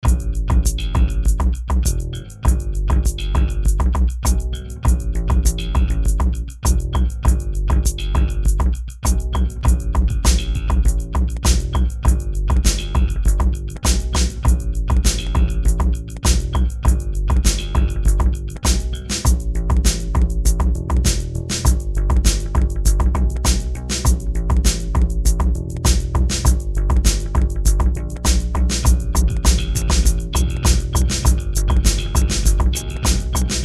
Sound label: bass square